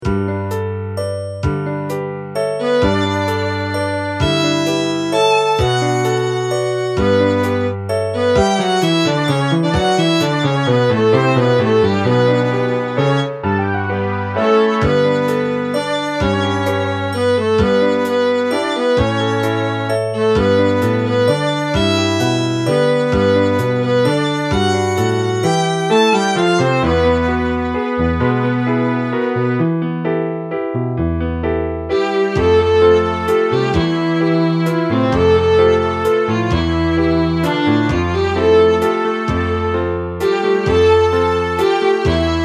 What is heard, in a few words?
Waltz